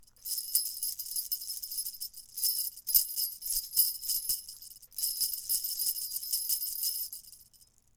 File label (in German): band mini leicht, schellen und glocken; Baender